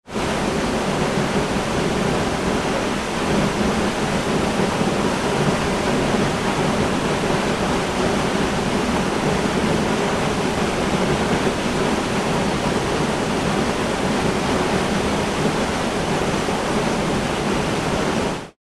falls

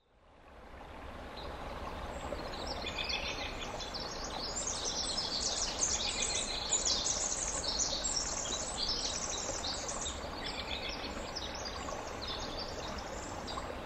wren

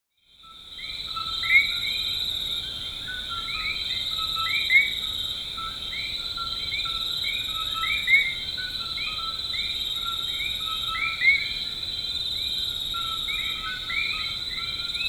Coqui; Invasive Coqui Frogs at Kohala Forest Reserve, Hawaii; Microphone: Sony ECM-MS907 Recorder: Sony MZ-N707

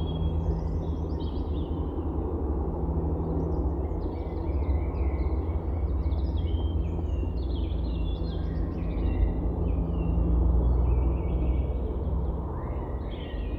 dawn noise

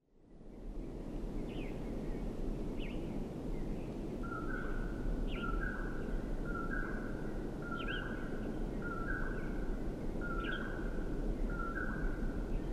poorwill; Common Poorwill, predawn in the Maury Mountains, Crook County Oregon.; Microphone: Rode NT1-A(x2)

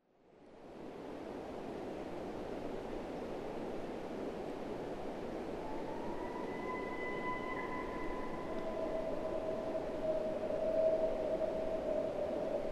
owls

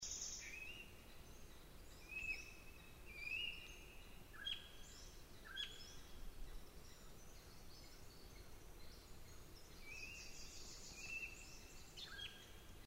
9am bird

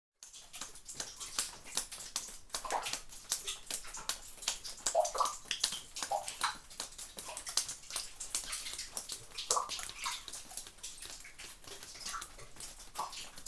ice cave; Water dripping from icicles inside a lava tube, Skamania County Washington; Microphone: Rode NT1-A(x2) Recorder: Marantz PMD670

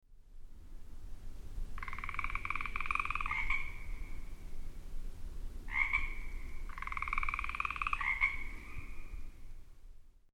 frogs

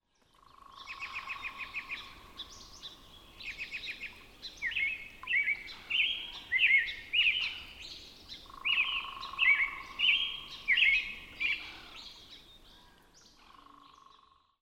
robin

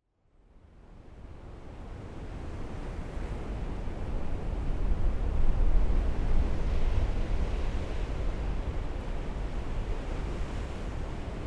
Jetty Waves; Surf Side of Bay View Jetty, Tillamook County Oregon.

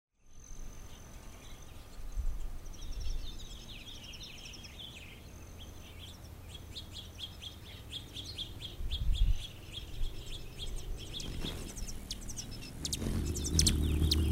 hummingbird

